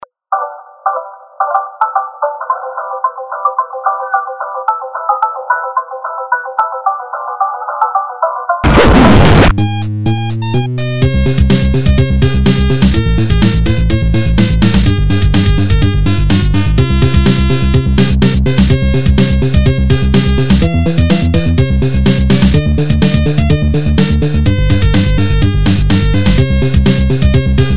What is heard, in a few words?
Oldskool Squarewave; Banjo-Kazooie